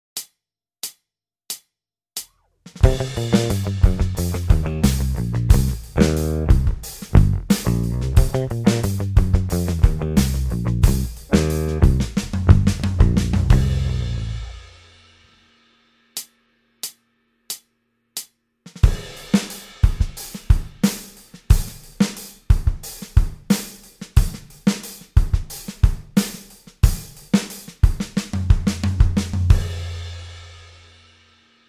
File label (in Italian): Basso Plettro Esercizio Backing Track; basso elettrico; come usare il plettro